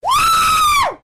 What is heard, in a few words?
whooo; alpha